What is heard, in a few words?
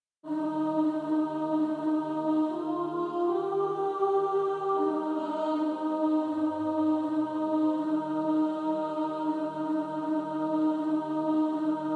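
alto, practice music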